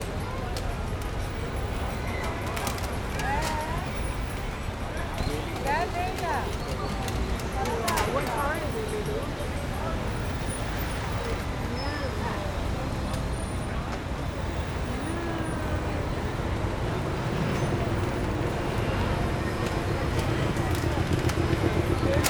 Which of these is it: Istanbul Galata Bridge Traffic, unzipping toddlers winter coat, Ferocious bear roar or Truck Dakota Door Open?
Istanbul Galata Bridge Traffic